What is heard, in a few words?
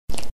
Suck; Lewd SFX